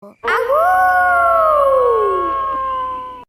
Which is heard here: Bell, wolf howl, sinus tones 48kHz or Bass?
wolf howl